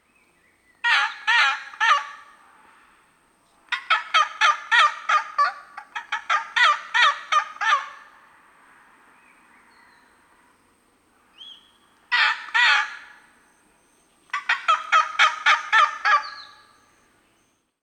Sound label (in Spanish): Caracara Gorjirrojo Ibycter americanus